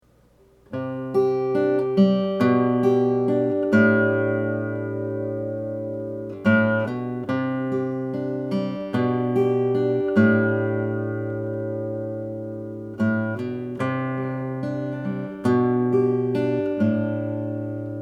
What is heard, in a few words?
Spring Instrumental